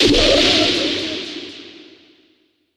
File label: Anime Super Blast